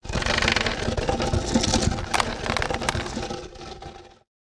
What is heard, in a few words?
sledge ramp; Immortal